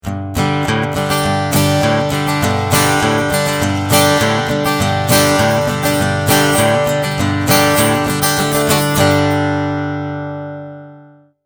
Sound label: Country Flat EQ Cool Tube